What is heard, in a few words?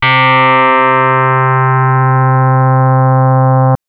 Synth FM 2 Op Basic c3